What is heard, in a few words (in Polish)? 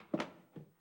krok powoli; apocalipsis